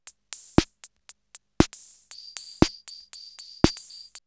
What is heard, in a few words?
CR78 BeatBox, drumloops, DrumMachines